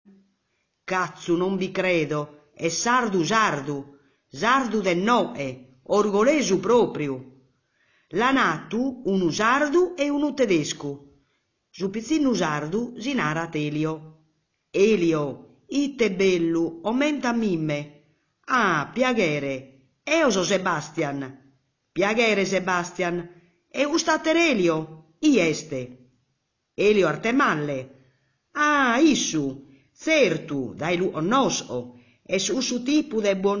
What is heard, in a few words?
Sardinian Orgosolo; Giga Sardinian